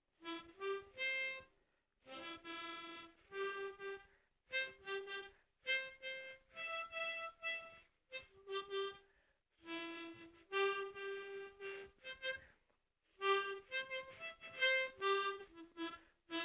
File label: mouth organ